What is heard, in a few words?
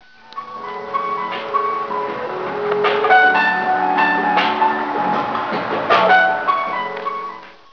jazz; cabazon dinos